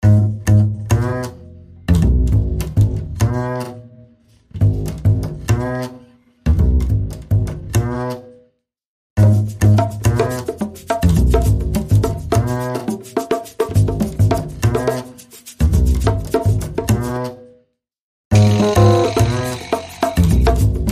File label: quirky beatnik